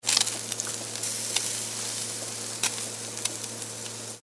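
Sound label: charcoal fire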